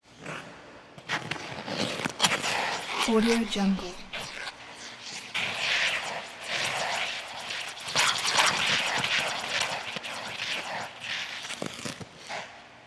figure skater camel spin

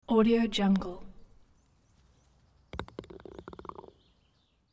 long golf putt; sound ideas

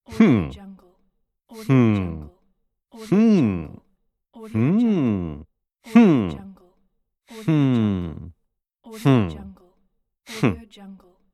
the hmm

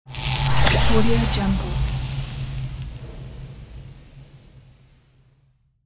teleport

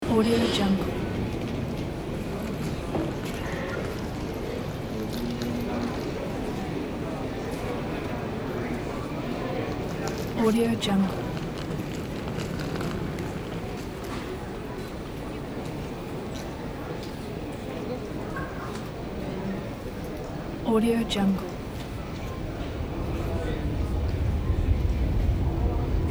airport ambience; wakerone

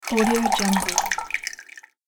water fast dripping

